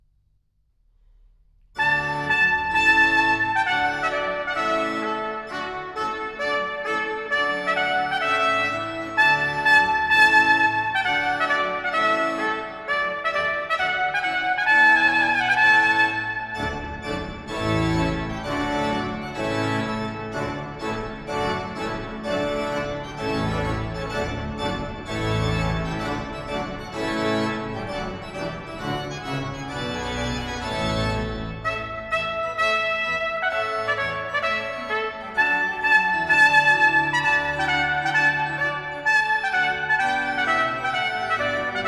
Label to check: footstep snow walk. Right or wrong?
Wrong